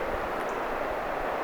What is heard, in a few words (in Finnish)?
jonkinlainen punarintalintu tietaakseni